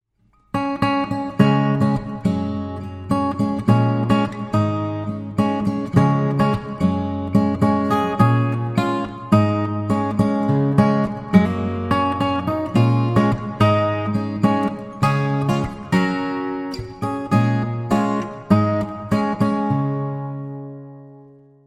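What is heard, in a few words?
guitarist, Instrumental Wedding Guitarist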